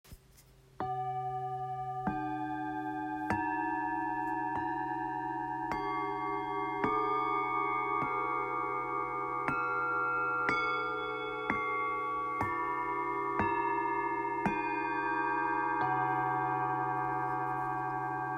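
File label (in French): Set therapeute bengale, bols chantants tibétains; eclipse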